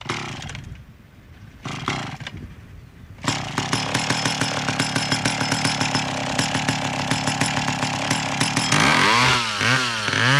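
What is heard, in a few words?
Chainsaw False Start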